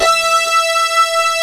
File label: LINEAR SYNTH